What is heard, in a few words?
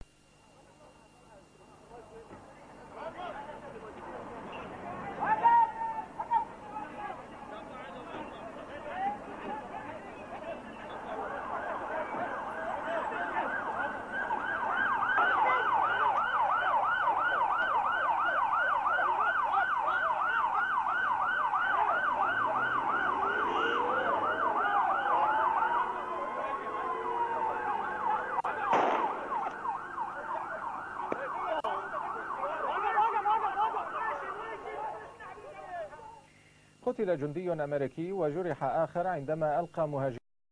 ambulance